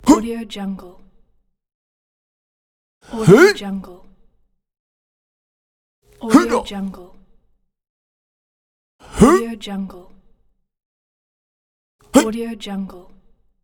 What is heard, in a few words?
fight voice